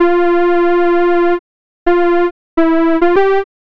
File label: synth sound, brutal sound